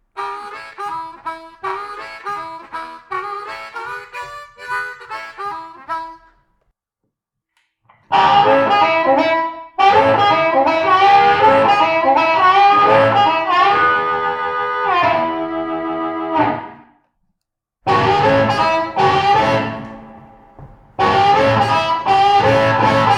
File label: Shure 545SD; HA1510 REV MKII